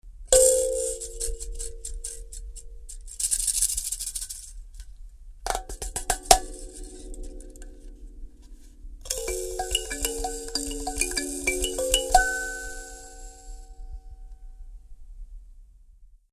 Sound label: kalimba